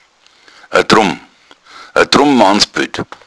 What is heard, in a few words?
drum